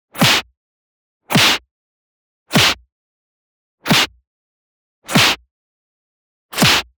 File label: PUNCH Slap Hit; boom library; Anime Essentials